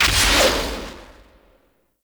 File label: Jump Attack Start Whoosh; Warframe; Zealoid Prelate